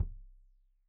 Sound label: Kik Stereo; Deeply Sampled Drums; SMDrum